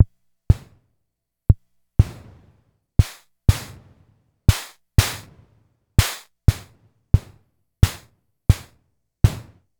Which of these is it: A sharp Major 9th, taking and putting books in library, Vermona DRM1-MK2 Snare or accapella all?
Vermona DRM1-MK2 Snare